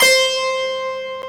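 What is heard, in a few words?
Harpsichord; CMI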